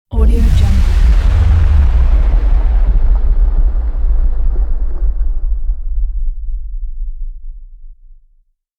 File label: explosion strike ripple wave; fxprosound